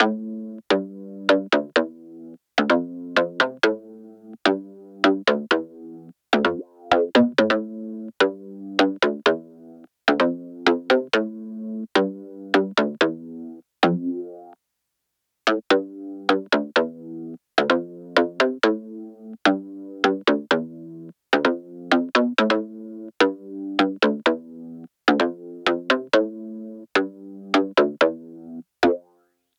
Bass Rvb Hit Layer Drop 128 BPM DRY

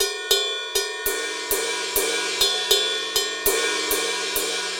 Ride 100BPM Acoustic Clean

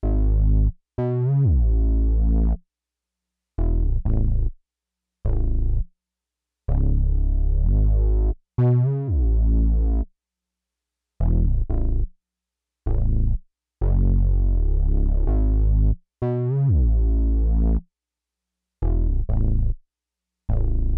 Bassline

